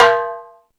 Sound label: Latin Drums, E-MU Pro-Cussion